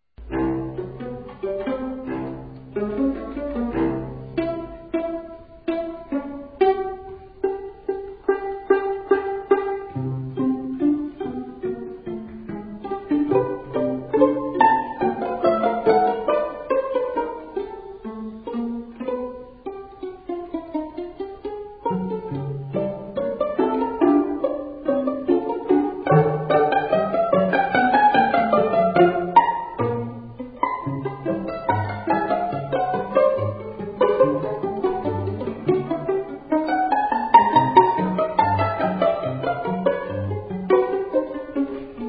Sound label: string quartet